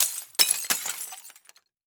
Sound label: Crash Concrete Pieces Dropping On Glass Debris; crashes; AdobeCC